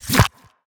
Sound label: Impact; Rebel